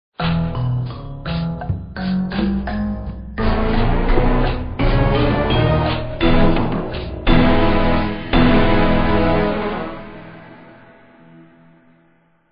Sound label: victory music; vapesquared